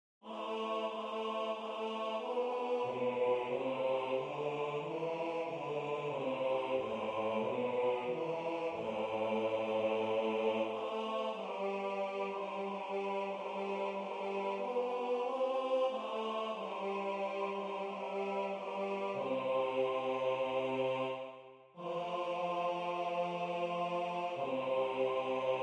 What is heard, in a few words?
bass; Waiheke Island Choral Society